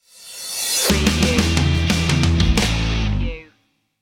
isolation blues stinger

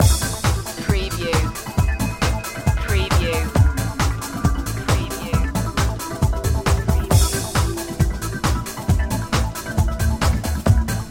electricity loop

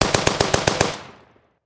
Machine Gun Vickers

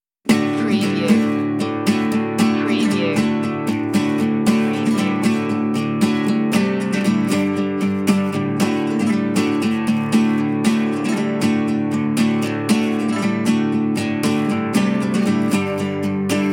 outro underscore

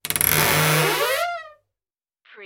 creaky wooden closet door

